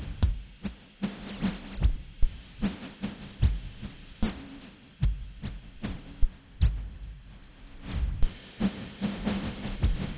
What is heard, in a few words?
gen drum